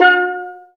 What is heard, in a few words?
STEEL DRUMS; Greytsounds; Scott Peers Sound Engineering